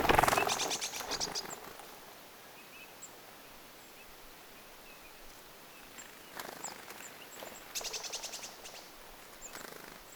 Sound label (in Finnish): onko sinitiaislinnun huomioääntelyä